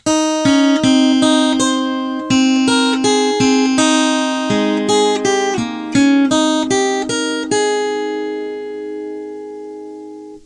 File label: jr chime